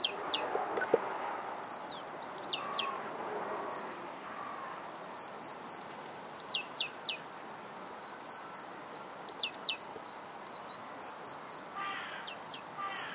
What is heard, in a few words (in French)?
mesange charbonniere communication